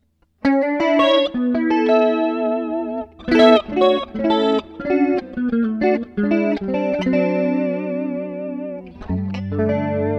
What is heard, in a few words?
Clean Chorus